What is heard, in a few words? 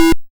YM Synth E; SYNTHS; CHIPSHOP DELUXE; CHIPSHOP HITS